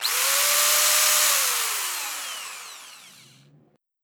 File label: Drill; Human Hunter Drill1